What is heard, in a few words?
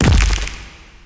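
sand atk, player impact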